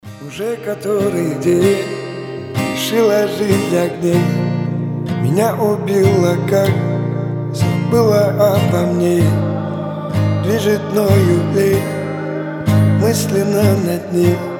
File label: acoustic version